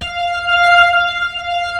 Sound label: F, The Piano; InVision Interactive